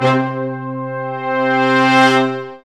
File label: BRS, Lrg Ensemble; Roland L-CD702